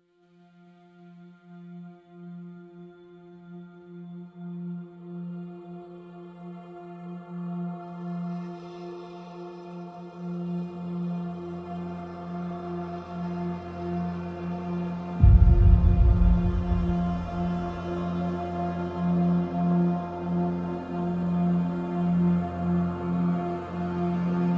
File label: Pads Only